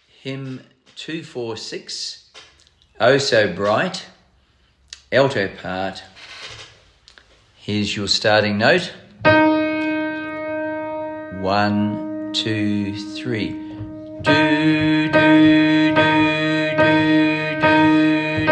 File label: Alto SATB; O So Bright; Hymns for Jubilation; in house choir